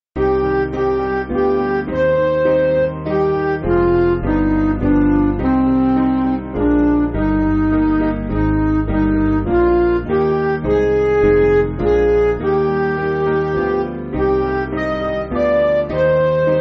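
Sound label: Music Backing Files for Churches; Leader Of Faithful; Mt Beacon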